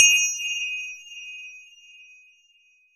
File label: Tibet Cymbal; Cymbals